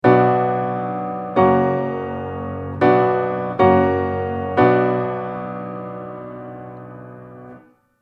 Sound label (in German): akkordfolgen